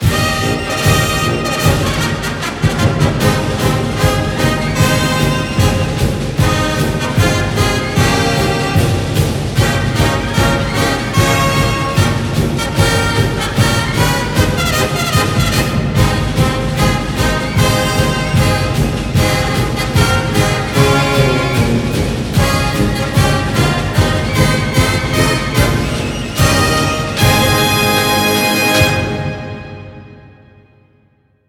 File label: Fight Song